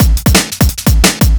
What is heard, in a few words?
breakcore breaks